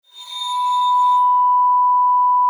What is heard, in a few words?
arco H5, vibes; Xsample VOL-4